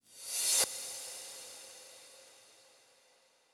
135BPM Reverse Fx; Sound FX; F#minor

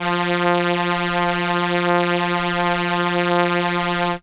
Viola F3, synth, ARP Solina; musicradar-synth-samples

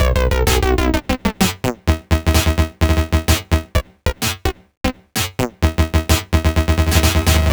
128BPM Tribal Kiss Melody; VFH1 128BPM Tribal Kiss Kit

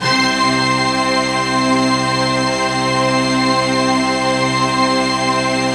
Synths HiString C; Vengeance Samples

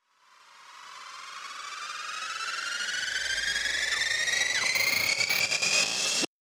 ReverseFx 138BPM; Sound FX; Gminor

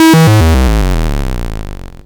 Arcade Riffs

